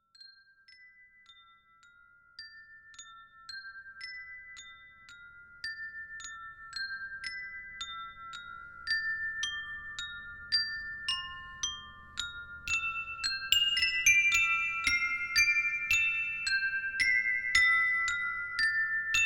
Whist; Brother Bunny

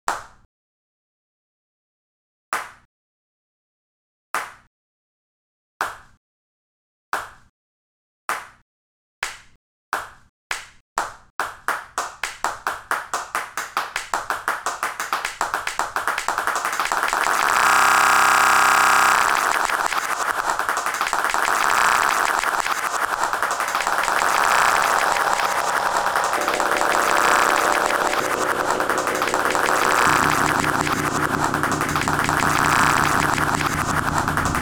Clap

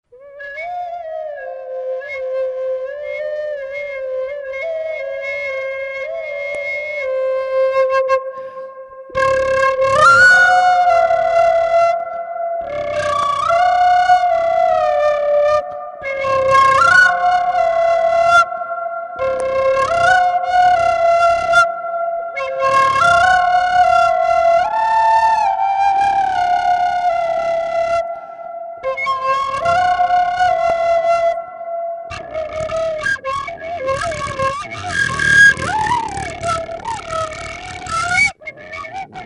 spit flute; Brother Bunny

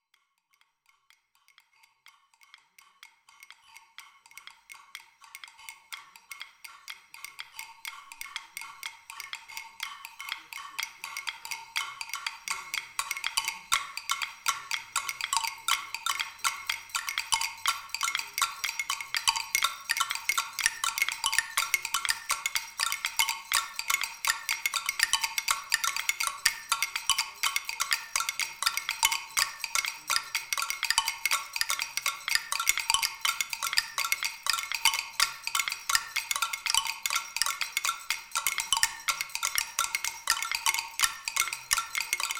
Drop

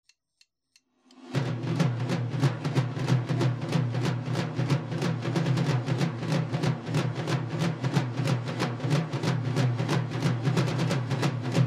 wardrums

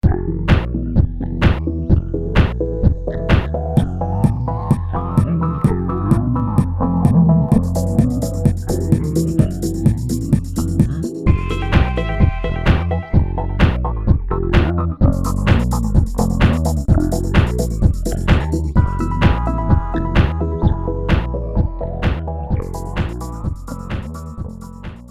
prog trance; prog trance clip

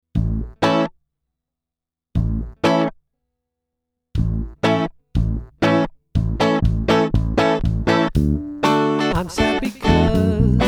Buzz

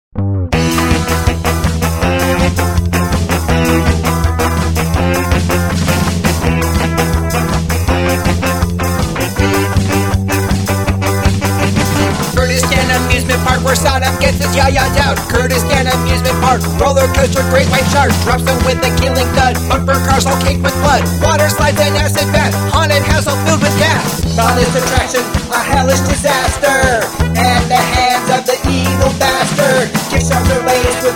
kurdish

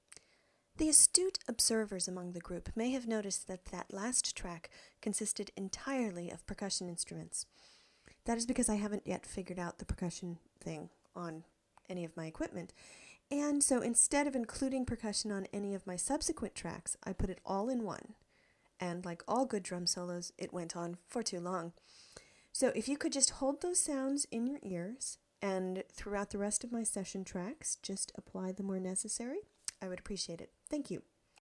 percussion; percussion after

